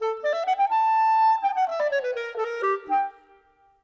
Clarinet; Sonic Foundry (Sony Creative Software); Orchestral VOL-2; Modern 27

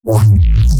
A bent Koan shot, bass

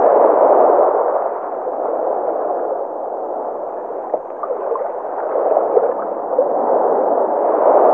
SUB-AQUA, SUB AQUEOUS; Zero-G Ambient 1

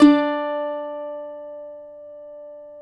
cuatro; Spectrasonics; Hans Zimmer Guitars VOL-2

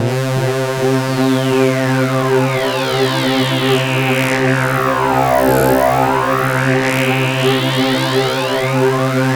SYN K-2000, Roland

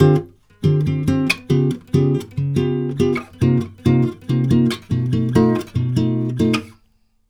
CHORDS, Brazil Bossa; USB Soundscan vol.16

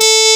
muted, SC55 brass

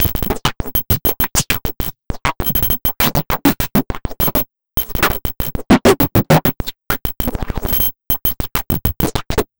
Envelope Beat; Drums & Drones

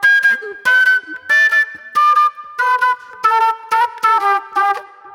Sharp Delay Flute

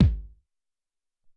House Kik, Alesis SR-18

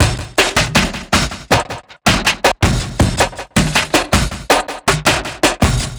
Funky Beat, Drum N Bass; Sonic Foundry (Sony Creative Software); Knutrix Drum & Bass

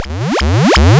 Game Boy FX, chiptune; Nintendo Game Boy

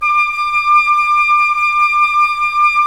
Flute, Roland LA Composer

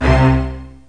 ORCHHT, Proteus; Drums

